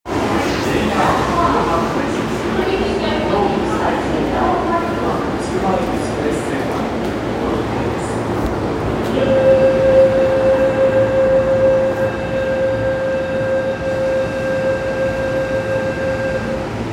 JR 常磐線 北千住 北, train sounds

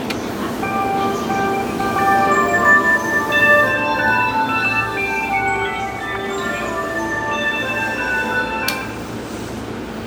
JR京浜東北線浦和北, train sounds

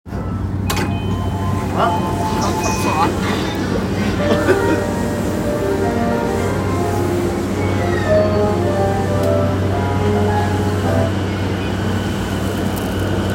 JR 山手線 田端 CCW, train sounds

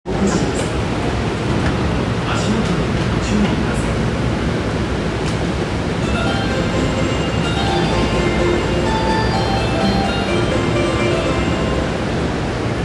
有楽町線護国寺西, train sounds

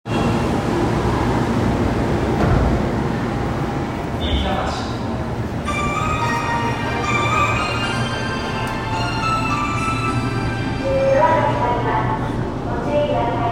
東西線飯田橋西, train sounds

